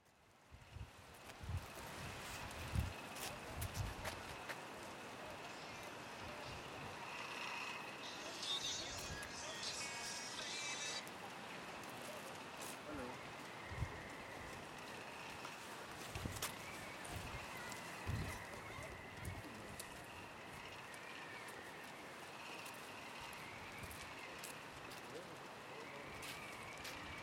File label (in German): warnemunde strandpromenade mit mastgerauschen